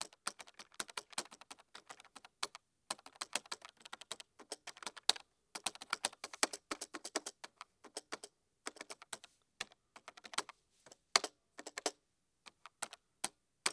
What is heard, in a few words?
Laptop Keyboard Typing